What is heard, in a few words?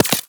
Laser Impact Generic; Warframe